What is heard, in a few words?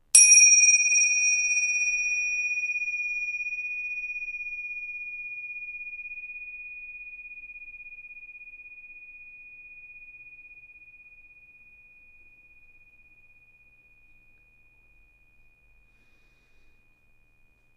Meditation Bell; Âm Thanh của Pháp Khí; Meditation Bell 1; Sound of Dharma Instruments; Buddhist Music